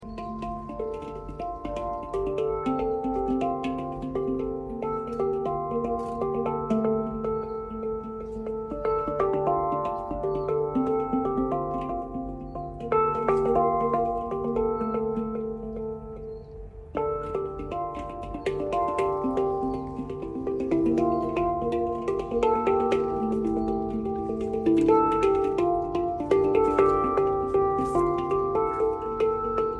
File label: Hang Drum Eco Test